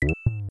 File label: yoshi swallow; smw